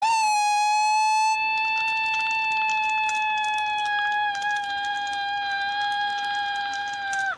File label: goofy screech